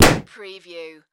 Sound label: Balloon Pop; sound effects bible